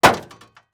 Impact Metal Bang

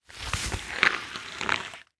Impact Brick Drag Through Leaf Debris

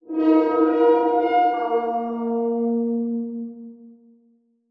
Title Transition Logo; Production Element

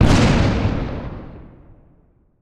Explosion Mine Blast; Fire and Explosions

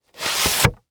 Technology Electronic VHS Tape Remove From Sleeve

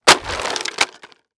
Impact Wood Crash Multiple

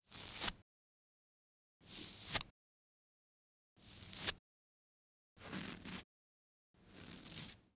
Human Shaving Face

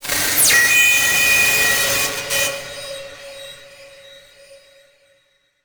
Title Transition Communication Distortion Jammer; Adobe; Production Element

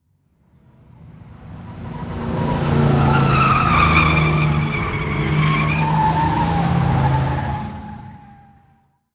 Transportation Car Race Car Drive By Curve

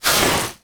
Slide Into Base On Dirt; Sports

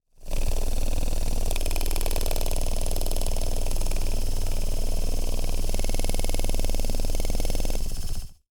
Industry Tool Motor Run Small

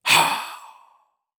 Horror Scary Vampire Hiss Short Hiss

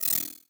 Transition Digital Bleep; Production Element Title Transition

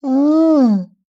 Animal Mammal Herbivore Rhino Call; animals; AdobeCC

